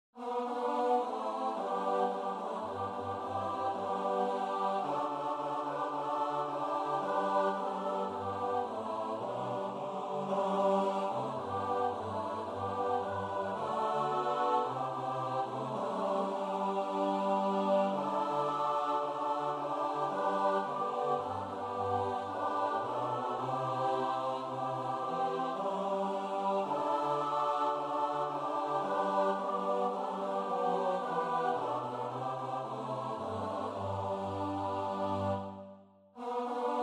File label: TUTTI; DOWN TO THE RIVER TO PRAY